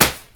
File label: confetti